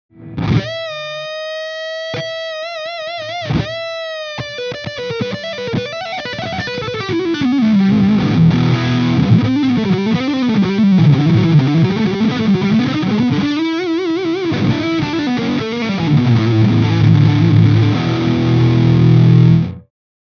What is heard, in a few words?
HIGH plus BRIGHT; GRAVITY SPOT